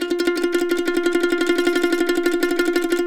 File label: CEE TRILL F R, Blues Guitar